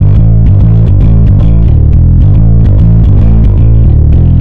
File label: Bass, Distorted A; Bass X